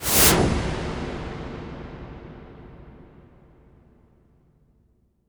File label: IMP WIPE, Cinematic Impact